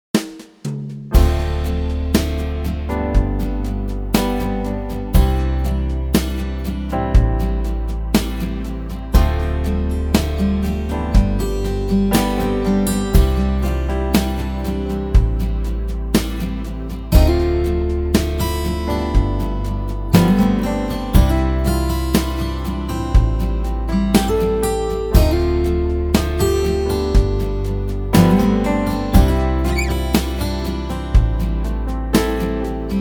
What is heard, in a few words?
Acoustic Guitar; Kontakt; Slow, chill, soft demo; Fingered